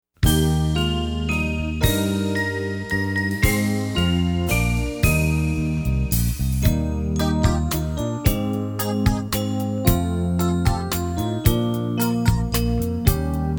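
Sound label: we wish you a merry christmas accompaniment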